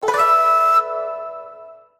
Save Game Jingle

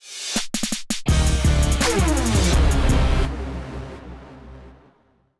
Mission Failed; Gangstar Miami Vindication; Android; macOS

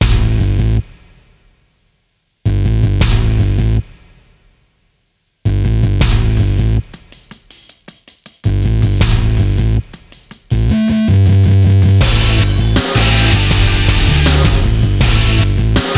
Op Music Metal

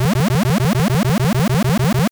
Toxic Bubble Loop

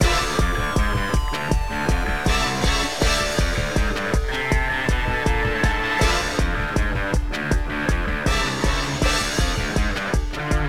Combat Loop

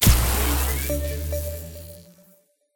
puzzle complete; ConduitSFX

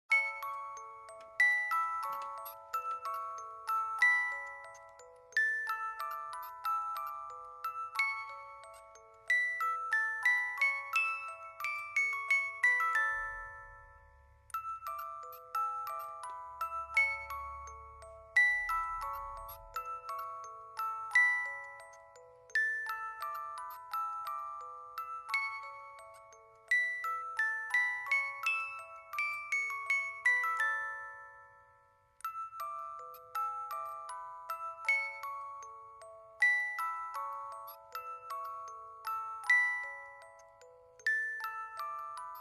Musicbox; Aveyond Gates of Night

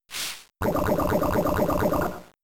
Poison Gas; Poison Gas part 2

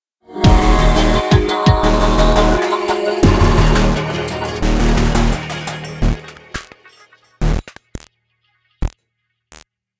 Fight Low Combo; Remember Me

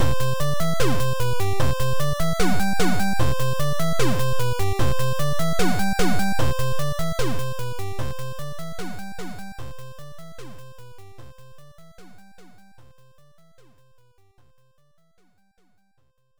Round Clear; Yoshi's Cookie; NES

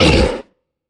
troll monster hurt pain

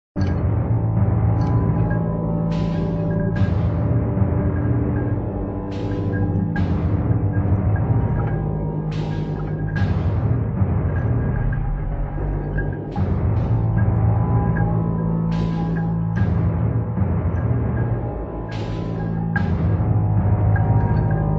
Pipes (Mood); Alice: Madness Returns unreleased original soundtrack; Hatter

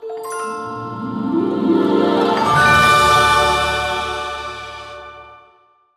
Success; epic mickey wii gamerip 2010

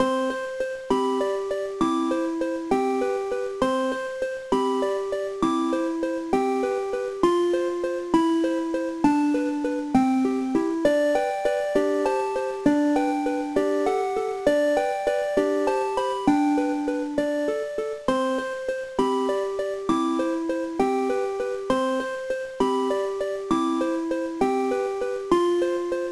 BGM; Hello Idol Debut Kids Idol Ikusei Game; GBA

